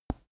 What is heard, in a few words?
teeth clench bright, koerpergeraeusche; menschen; soundpool